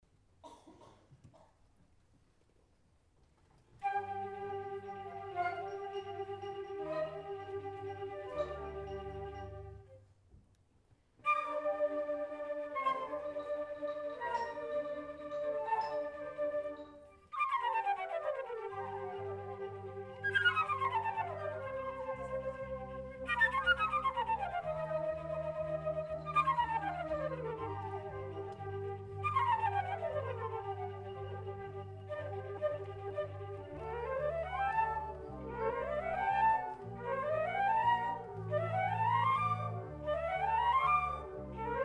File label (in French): Enregistrement tascam concert Eté presto; Marcheprime 31 janvier 2016